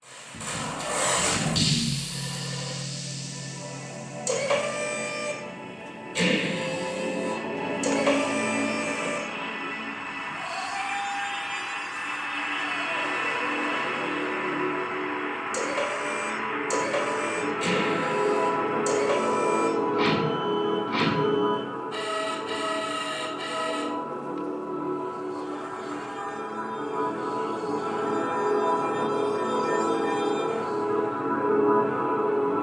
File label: robot